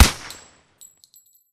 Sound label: vector silence; emx umx32